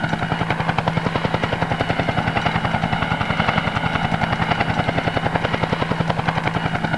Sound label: rotor; gearbox